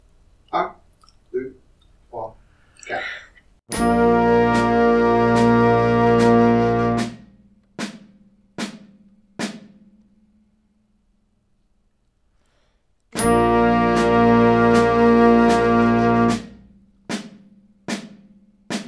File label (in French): 2 3 4 oups noire a 76